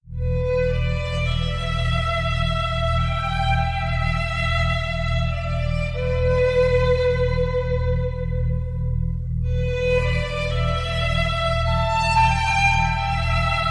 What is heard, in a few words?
strings, Sad Loops